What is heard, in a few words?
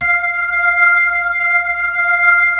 B-3 Chorus